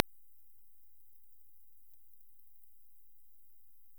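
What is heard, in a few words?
Chalinolobus morio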